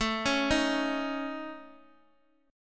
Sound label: A Major Flat 5th, Guitar, Open D